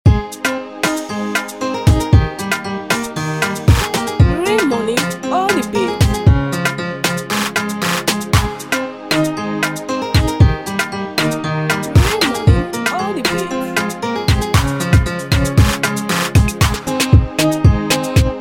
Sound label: beat with tag